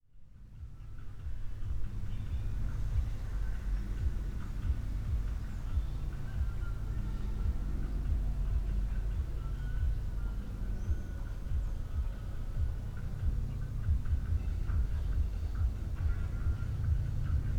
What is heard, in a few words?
temp stadium; sergiyevposad